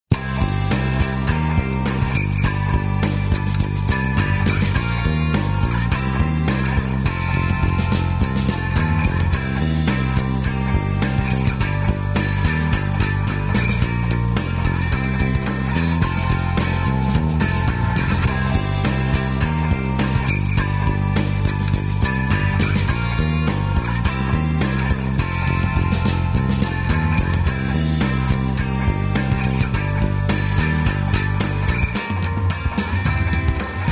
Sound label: Twang